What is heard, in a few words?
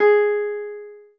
spanish guitar